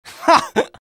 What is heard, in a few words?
laugh short